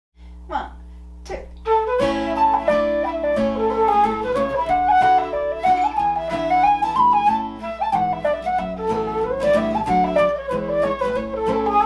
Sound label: Flute and guitar